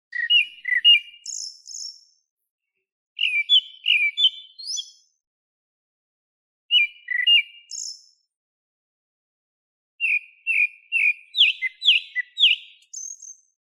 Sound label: kurotsugumi, birds